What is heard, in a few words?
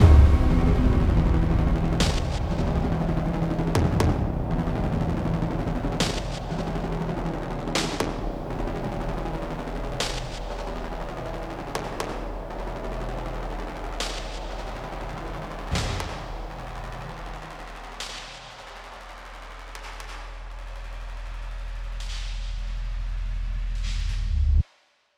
Music Boss (BossFight Phase=Ending); Music Boss Hideout3; Kuro End SYNC 2